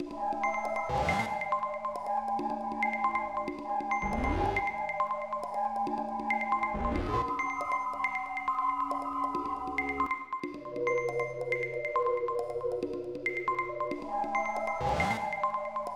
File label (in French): ambiance bizarre electro intro, 138bpm